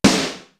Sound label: SNARE BIG FAT; East West; Global Sounds